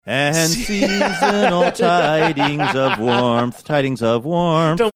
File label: singing and seasonal tidings of warmth